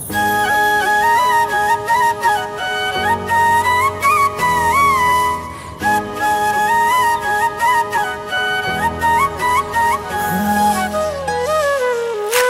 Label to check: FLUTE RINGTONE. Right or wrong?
Right